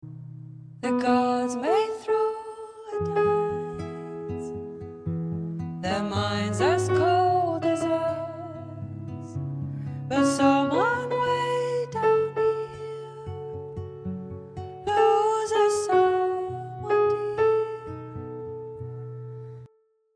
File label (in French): guide vocal; solo